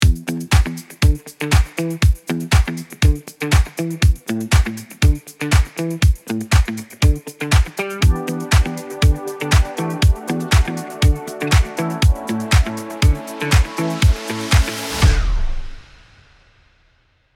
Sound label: music